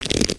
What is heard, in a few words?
Pet Dragon Scratch; creatures pets